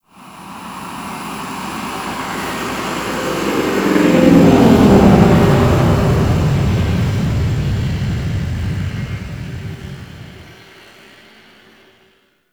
Ship Take Off; Warframe; Grineer Settlement

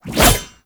Bayonet Melee Combo; Warframe; Tenno

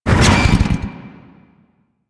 Motorcycle Stop; Vehicles; Warframe; Motorcycle Ninety Nine

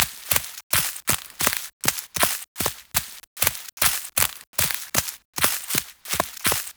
Horse Steps Walk Loop Vegetation; Warframe; Duviri Horse; Vehicles

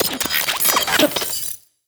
Venus Robo Fish Large Caught; Warframe

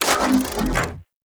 Arch Gun Primary Beam End; Weapons; Warframe; Archwing; Hildryn

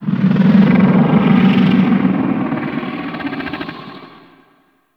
Golden Maw Warning Vocal; Ambience; Gameplay; Warframe; The War Within

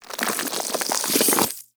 Infested Flesh Door Close; Ambience; Props; Warframe; Infested Eris

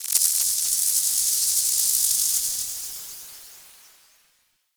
Tornado End; Warframe; Tengu

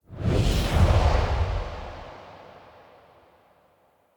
Wolf Summon Ghost Vanish; Animals

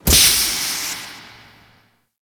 large door steam burst; Ambience; Gameplay; Warframe; Corpus Gas City; Gameplay Remaster

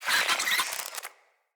Infested Ashen Vocal Attack Death

